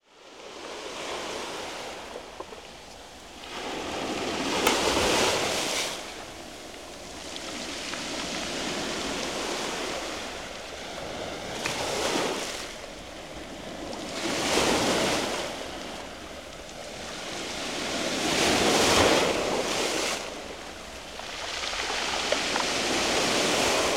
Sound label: San Remo Spiaggia Waves from side Pebbles